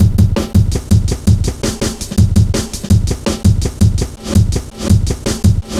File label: Hard Edged, Drum Loops, 165.5